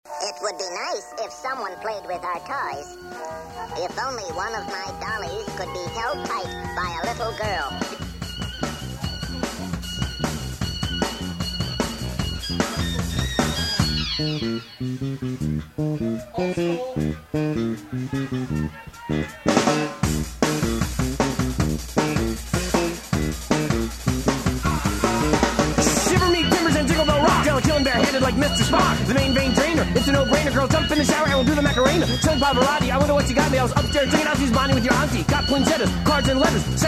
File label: Christmas Cat Rap